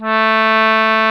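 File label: CONCERTINA; E-MU Formula 4000 Series Vol. 4; Earth Tones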